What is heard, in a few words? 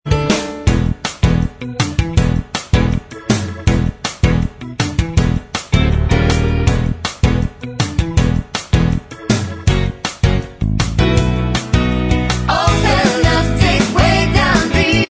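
backing vocals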